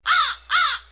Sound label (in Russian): soroka